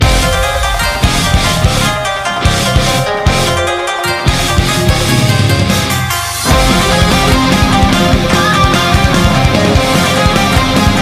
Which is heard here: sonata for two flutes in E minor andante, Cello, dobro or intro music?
intro music